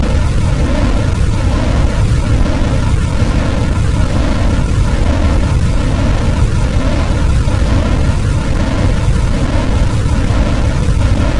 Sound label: rumbly